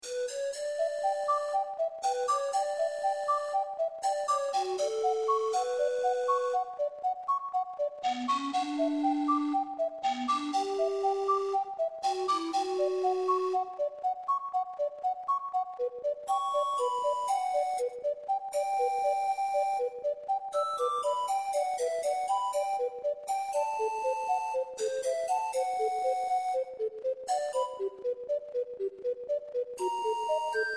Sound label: ocarina balad, (ocarina)